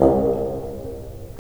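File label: wind bassoon; LA Composer vol. 3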